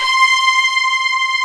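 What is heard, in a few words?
pop strings C5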